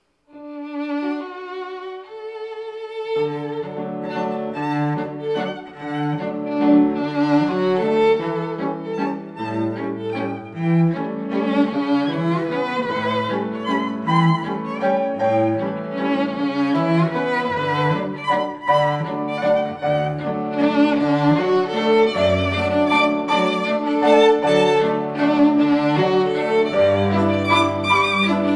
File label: Blue Danube quartet